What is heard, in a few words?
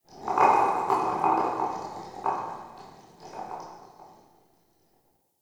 Ship Creak; Ambience; Warframe; RailJack